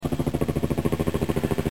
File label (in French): Helicoptere